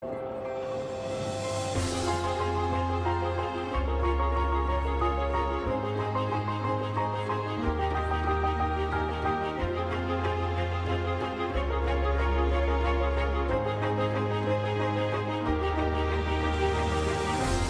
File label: Waltz